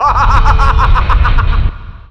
laugh; rogue

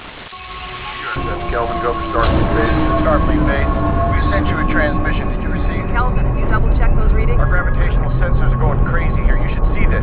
radio

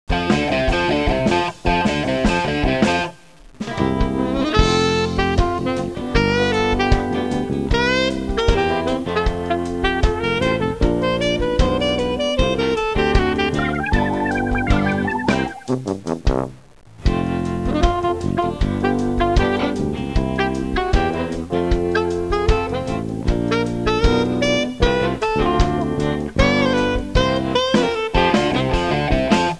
music

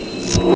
zap; spawngirl

